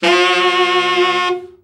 BRA TENOR OB, SAX; Best Service Gigapack 1; BR SAX 4

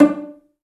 Cell Pz D4; Digital Sound Factory Virtuoso

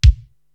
BD Tight, Korg TR-Rack

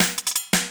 LOOP SD; Ueberschall; Drum & Bass Resonance

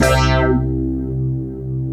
OOH FNKY C3, Synth; FOOONK

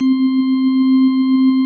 ORGAN; Best Service; Dance Mega Synth; 90 s Sample CDs

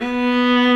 Vla B2; Digital Sound Factory Virtuoso

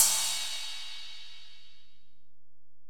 Ride, Alesis DM-PRO

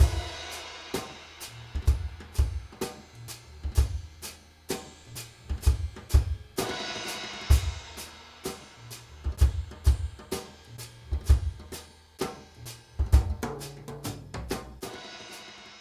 Softie, Drum Loops, Jazzy; Zero-G Smoke

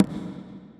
Conga; Equipped Music; Loungin House

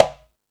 Latin Layers, Drum Machines E-MU Pro-Cussion

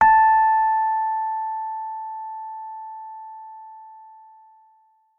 Rhodes forte a5; Best Service ProSamples vol.42 - Session Instruments

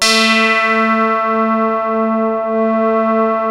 PPG A3; Hollywood Edge - Giorgio Moroder Rare Synthesizer Collection; PPG.2.3

